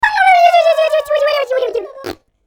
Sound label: Giggle